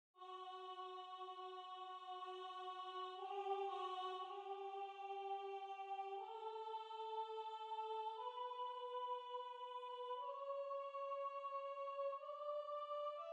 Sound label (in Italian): Soprano